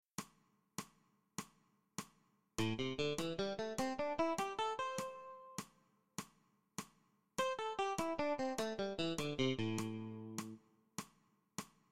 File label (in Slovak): osminove trioly tlmenie zapastim; cvicenie